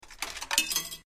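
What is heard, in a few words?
vending machine coin drop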